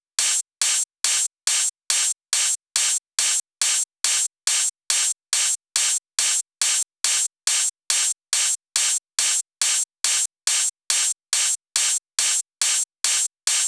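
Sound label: drum Loop 140BPM overhead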